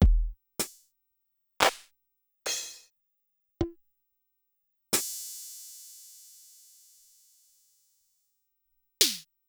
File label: LXR; Sonic-Potions; Casio Pop